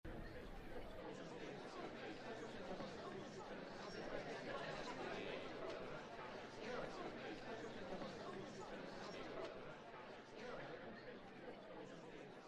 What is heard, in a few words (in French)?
Fermeture convives